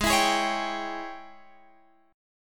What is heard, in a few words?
G sharp Major 7th Suspended 4th Sharp 5th, chord; x,x,x,13,16,15,15,16